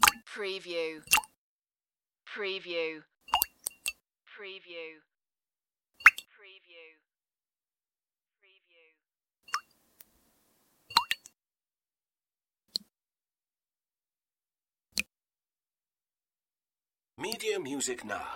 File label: Water Drops